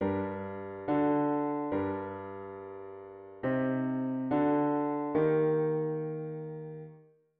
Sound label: diat root w bass